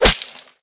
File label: knife hit; weapons